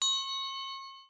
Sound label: Bel; FRACTIONS PIZZA